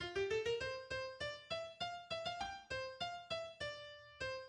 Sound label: Piano; Holst Second Suite